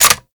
Reload Dual Pistols; Warframe; Grineer; Queen Guard

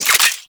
Pickup; Pickups; Inf Eris Corpus Survivors

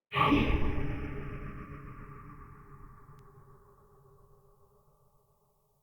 Soul Plane random, ambience

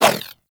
Infested Throwing Star Whoosh; Weapons

